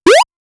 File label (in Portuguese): pulo de um personagem de, pixel